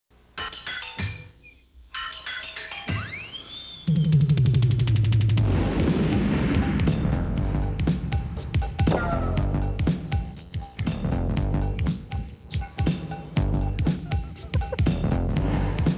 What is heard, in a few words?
urban groove